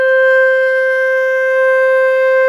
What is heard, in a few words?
flute